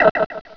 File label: detach connection; Widget Workshop; A Mad Scientist's Laboratory (1996); Maxis